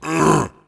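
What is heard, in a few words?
zombie hit; DAoC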